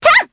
Vox Jump, jp; voices heroes jp; Aisha; kingsraid

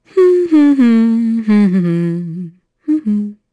Vox Hum; Glenwys; kings raid

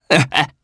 Laugh, jp; Ezekiel; kingsraid

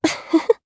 Laugh, jp; voices; heroes; Lorraine; kingsraid

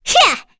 Vox Attack; kingsraid; voices heroes; en

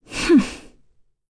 Vox Laugh; en; voices; heroes; FreyB; kings raid

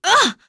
Damage, Vox; kingsraid; voices heroes en

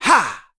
Jump; kingsraid

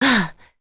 Vox Attack; Pavel; kingsraid

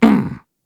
Vox Landing; en; voices; heroes; Kibera; kings raid

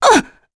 Vox Damage; Shamilla; kings raid; en

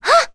Vox Jump; Rehartna; kingsraid

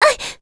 Vox Damage; Aisha; kingsraid; voices heroes

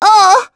Down; Sonia; kings raid; en

